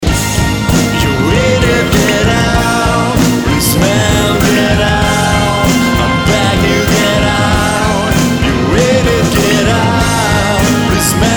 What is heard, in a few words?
Ringtone